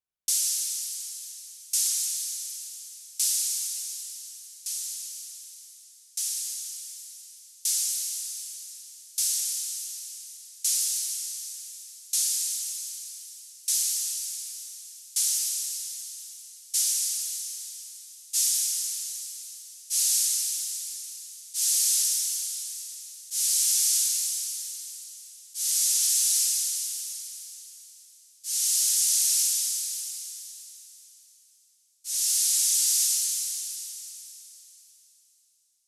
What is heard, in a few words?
Korg Volcadrum Noise EG